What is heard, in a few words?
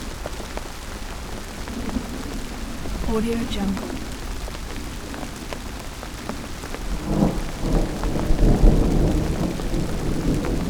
thunderstorm substantial downpour loop; باران و طوفان رعدوبرق